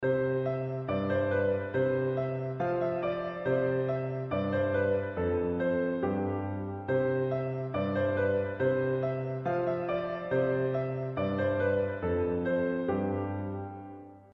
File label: melody